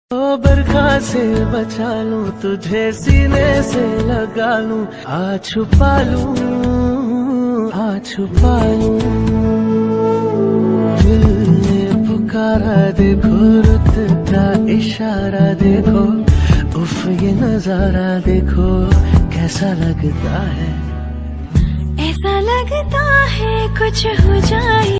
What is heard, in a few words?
Ringtone